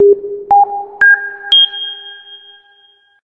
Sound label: sinus, notifications